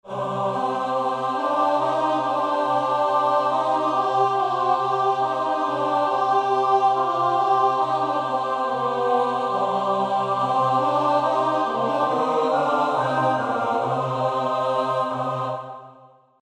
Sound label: LIRA; Syritka